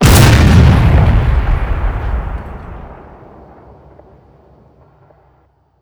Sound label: tiger cannon, simulated vehicles weapons